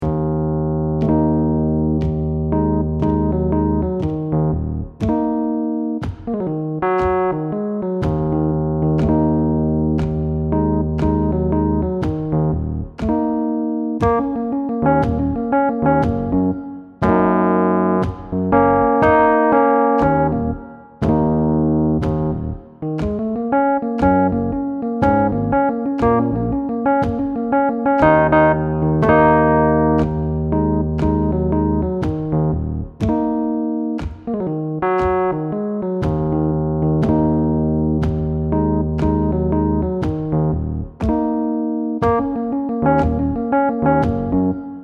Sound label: ebm melancholic